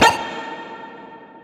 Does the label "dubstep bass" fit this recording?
Yes